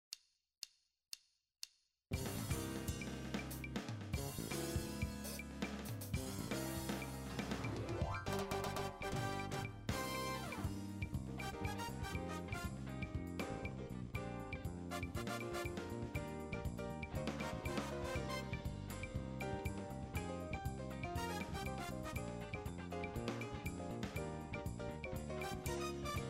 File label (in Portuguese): salsa louca